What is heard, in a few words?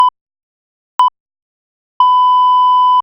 Countdown Beep